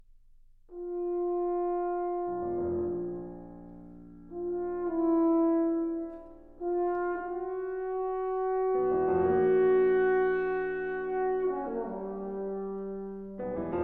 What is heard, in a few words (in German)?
Sonate für Althorn und Klavier